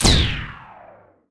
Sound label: Shooter; TR3; Playable Tutorial Level